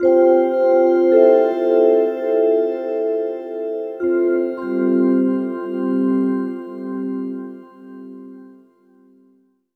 Pitch Vibe, vibes; Sonic Foundry (Sony Creative Software); James Johnson Spektral Minimalism; Sublime Keys

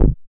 BAS MINI, Mini-Moog

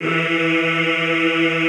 E2, Extended Classical Choir; Best Service

